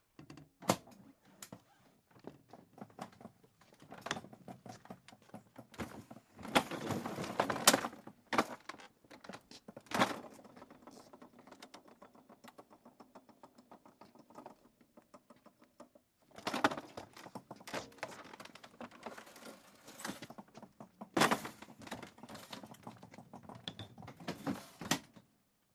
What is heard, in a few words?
Ambulance Unloading, gurneys